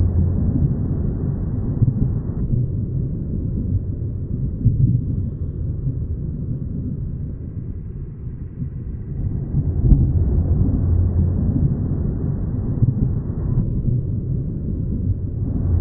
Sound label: Thunder Distant Loop